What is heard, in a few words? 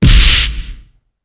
HIT KICK; UFCTapout1; XBOX